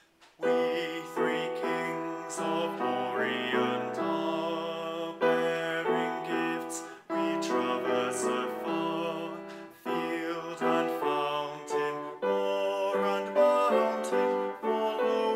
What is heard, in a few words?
Basses; the kings of orient